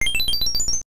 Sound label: death tinkle